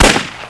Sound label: sarge rifle; Army Men TiS